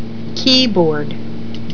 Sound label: KEYBOARD